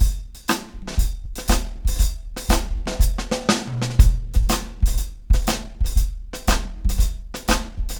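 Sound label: 120 beat; Big Fish Audio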